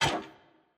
Monkey Stuck; Puzzle

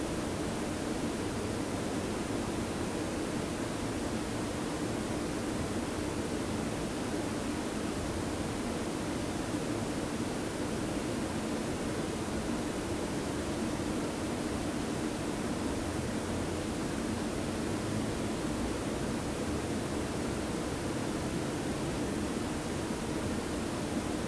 AMB; DEMACIA

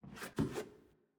Footstep Basement Wood Rotate

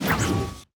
Enemy Attack Lunge Cast; bloom minigame

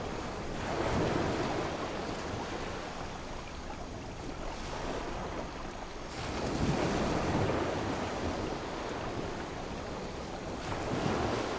Amb Pre Port; bloom minigame

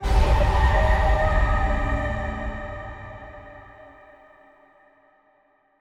UI event game over; bloom minigame

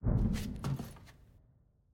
Cable Exit; Puzzle

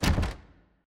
Office Door Locked

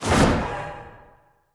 Enemy Boss Generic Attack Cast; bloom minigame

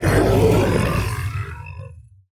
Enemy Death; bloom minigame

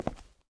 footstep; bloom minigame

